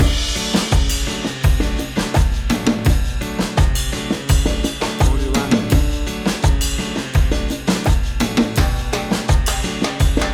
Calypso Summer Ballad

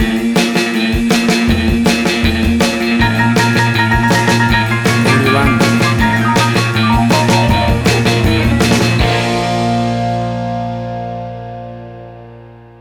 50s Prom Band

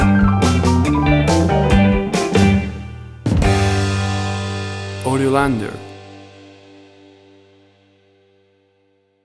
50s Surfing Music Stinger

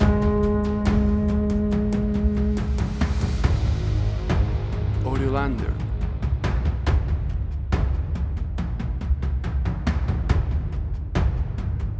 Atmospheric Slow Tension Stinger

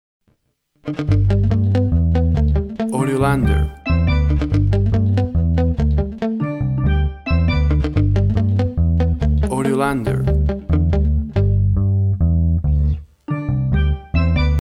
reggae splush

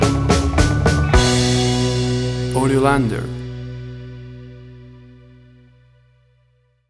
Slow Dance in the 50s Prom Stinger

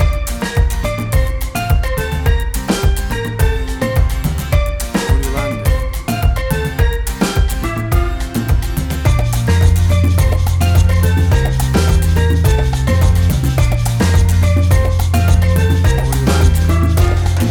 Bouncy Reggae Island Music

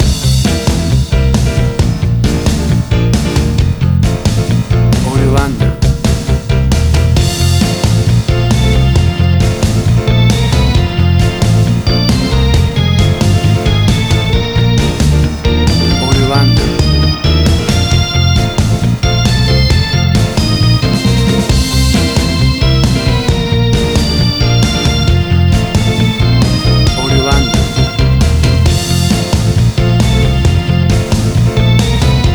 60s Swing Surfing

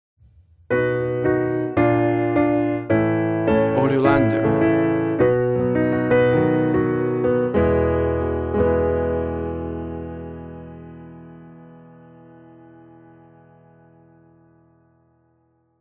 happy blues cadence; pasilva

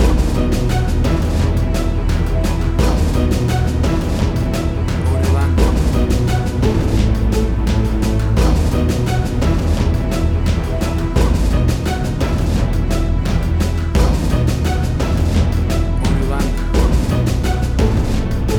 Big Scary Action Trailer Strings; Huge and pow

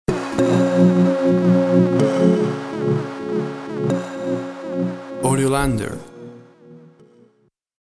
Electronic Dance Music Stinger; Top 40

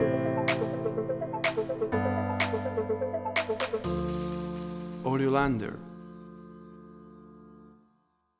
Arp House Stinger; A cool arpeggio dance mu; AUDIOLANDER

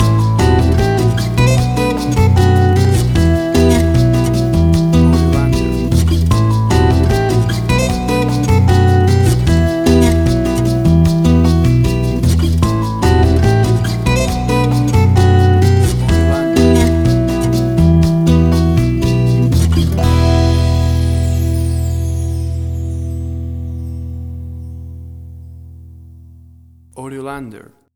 Acoustic Latin Jazz Band

